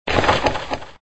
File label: Net Sound